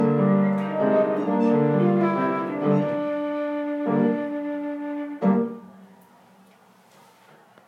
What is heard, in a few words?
Sicilienne, I'm playing the flute; faure